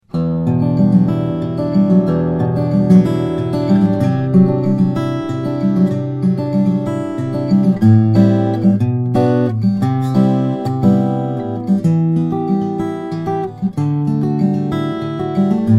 True North Model 2 GC Brazilian/Lucky Strike Redwood Wedge Body; grand concert; flattop; Dennis Scannell; True North guitars